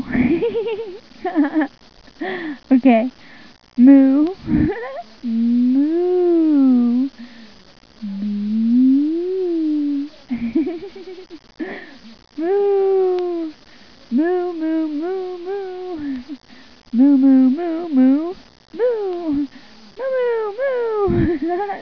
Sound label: moo